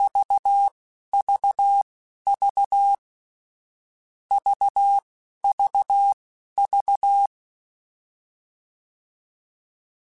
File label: All Groups, Morse Code; HamRadio; CW Code Groups; Basic Sets